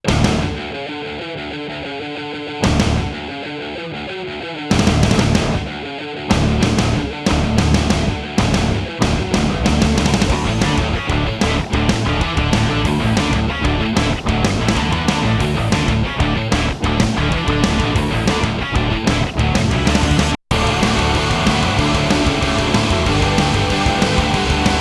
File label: Instrumental; Yorinuki Gintama-san